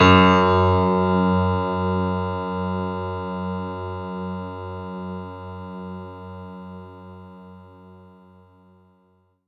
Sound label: F#2, synth